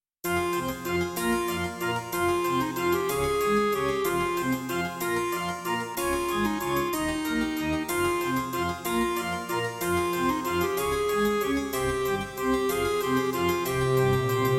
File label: Track 6, Roland JV-1080; Death Gate